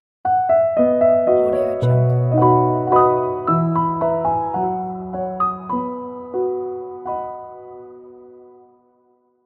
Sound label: the classical piano logo; soundrose